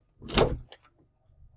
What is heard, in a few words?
door; ドアを開ける音